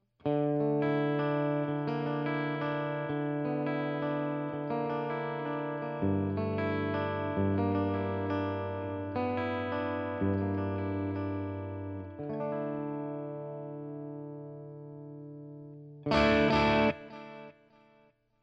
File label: Line 6 Catalyst Chime SM57